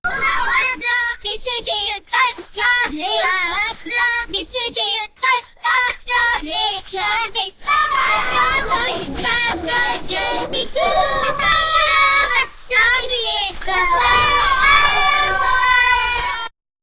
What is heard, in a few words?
kids cheering; tiktok 2018